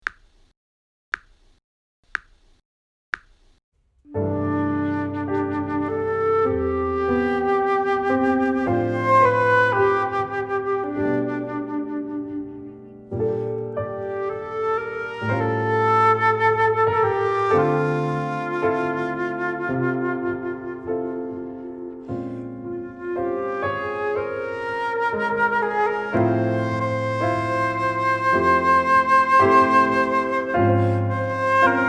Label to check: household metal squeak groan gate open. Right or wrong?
Wrong